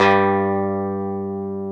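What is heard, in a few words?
E 12 String guitar; Club-50 - Foundations Roland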